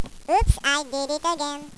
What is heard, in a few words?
children victory; valve